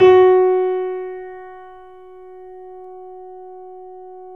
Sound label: F#, Boesendorfer, 3D Pianos